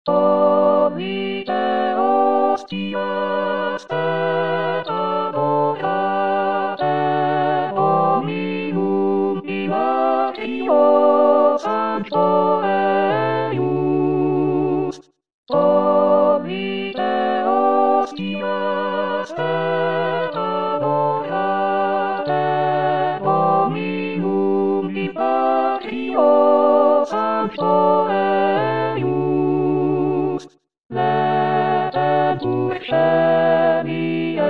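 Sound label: alto; Tollite hostias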